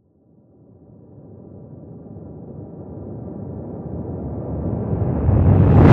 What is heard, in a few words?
Swell; bottlerocket